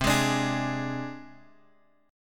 C Suspended 2nd Sharp 5th C Guitar Open G

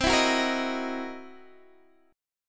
C 7th Sharp 9th Flat 5th C Guitar Open D

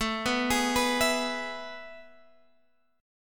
A Major 7th Suspended 2nd, Guitar, Open G

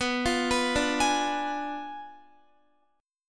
B Diminished 7th B down Guitar; arpeggiated; tuning 4F,4A,5C,5G,5C,6E

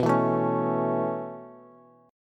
B 9th Suspended 4th B down Bass; B9sus4; strummed; JGuitar

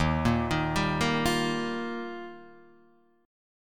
D sharp Major Add 9th, Guitar Open D

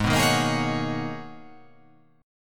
G sharp Minor 11th G sharp, chord

